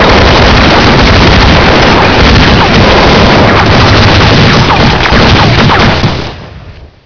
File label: crazy fire, ambience; czero